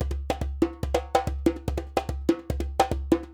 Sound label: NYC Percussionworks loops 144; Sampleheads